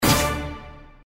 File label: farm scatter; games; Farm Mania; Happy Farm